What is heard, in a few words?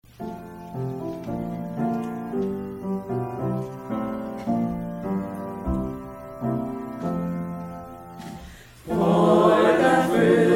Choir